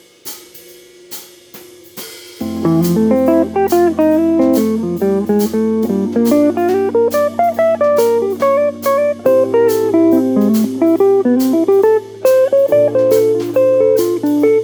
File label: practicing arpeggios, guitar; Arpeggio Practice For Guitar; simple jazz guitar lines